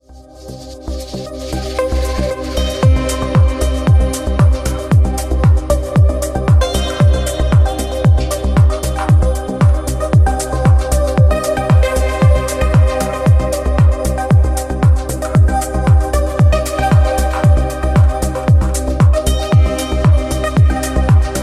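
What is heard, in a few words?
club bass ringtone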